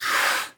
blowhole, dolphin; mob; Minecraft

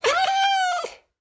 death, mob, cat, ocelot; Minecraft

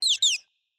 dolphin play; mob; Minecraft

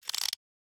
spyglass use; Minecraft

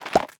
exit, beehive; Minecraft

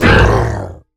hurt, mob, ravager; Minecraft

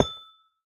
place, block, amethyst; Minecraft

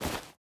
insert, bundle; Minecraft

